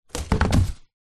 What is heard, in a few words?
present wrapped box fall over; zapsplat